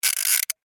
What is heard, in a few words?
Gear Wind Short, Large, Plastic, Clicks; Foley; BlastWave